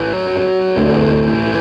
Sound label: Krush, Electric Guitar